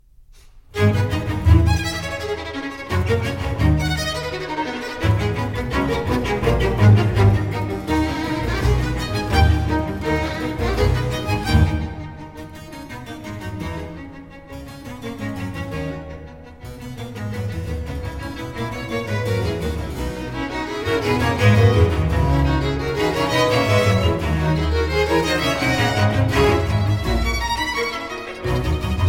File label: concerto for cello strings and basso continuo in a minor allegro; I. Allegro assai